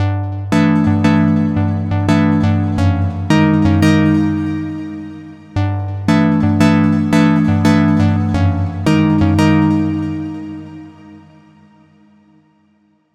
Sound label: MiniMonsta2; GForce; Eastern Province